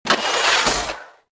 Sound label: sentry shield close